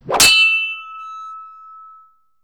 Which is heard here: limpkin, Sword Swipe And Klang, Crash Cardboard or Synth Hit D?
Sword Swipe And Klang